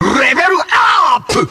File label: Level Up